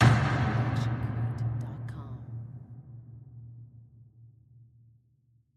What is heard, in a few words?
designer medium and hi hit; SoundsCrate